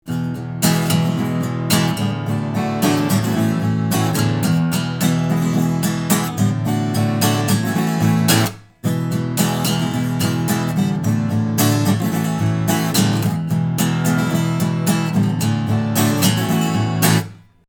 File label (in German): Gitarre Linear; LCT-140